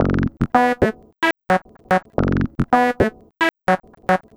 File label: Bassss; Sonic Foundry (Sony Creative Software); Synchro Funk